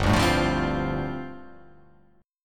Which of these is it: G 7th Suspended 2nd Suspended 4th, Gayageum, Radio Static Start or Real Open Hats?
G 7th Suspended 2nd Suspended 4th